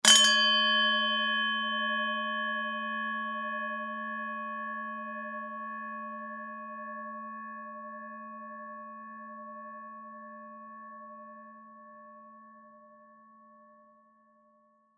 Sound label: japan rin bowl crash, D